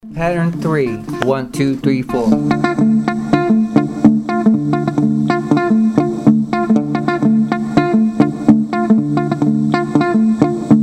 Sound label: mozambique patterns